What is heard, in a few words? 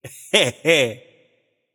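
nonverbal heh heh; smite; voicepack; merlin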